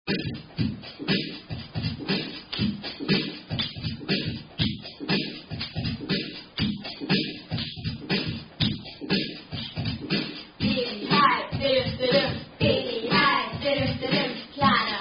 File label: (Rap), German Attack Song